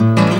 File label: STL STRNG CRD; Best Service; World Colours